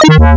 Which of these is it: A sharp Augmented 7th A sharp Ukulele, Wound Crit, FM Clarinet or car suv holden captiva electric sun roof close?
FM Clarinet